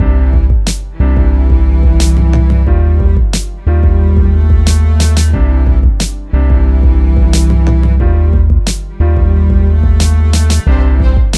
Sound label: Smooth Tech